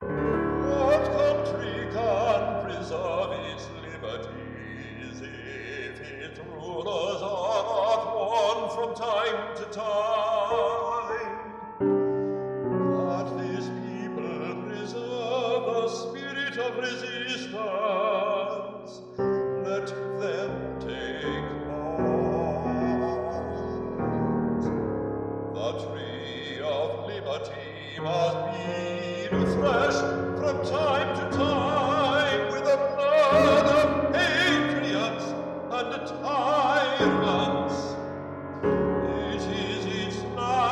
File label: voice piano; Sib piano